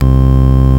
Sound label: VOX, VOX ORGANS; Greytsounds VOL-1